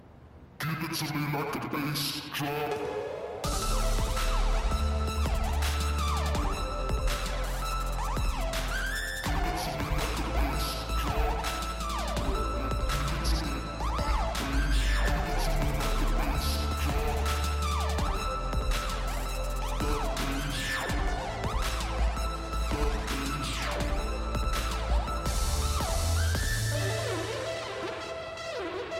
Trap Bass

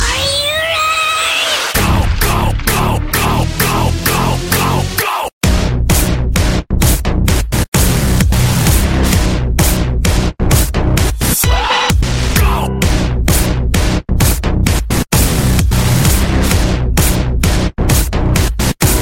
Dirty Electro 94

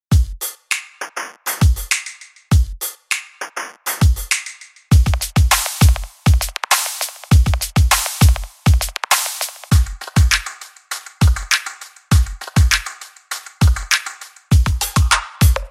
Cosmic Pulse

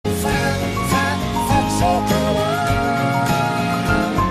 Text Tone

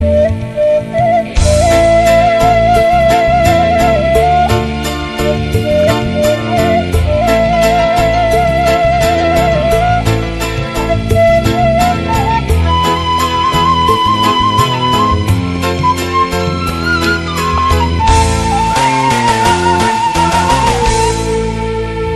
Suling Sunda

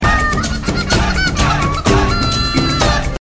Simple Saxi

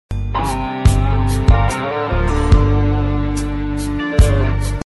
Cute Msg

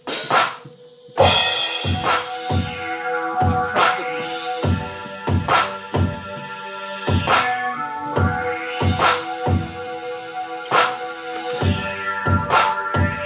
Dubstep Bass Guitar

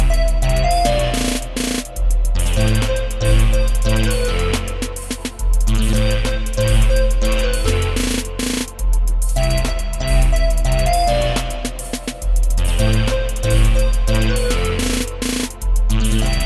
trap sound

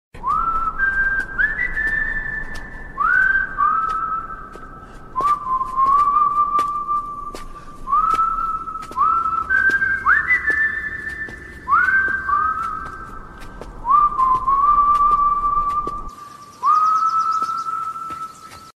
Whistle; Karamatsu

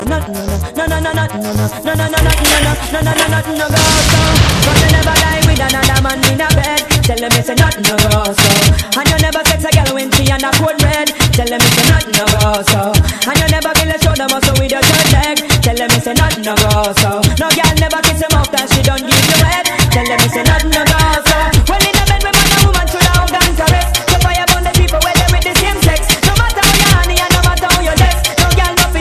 Bubblim Remix

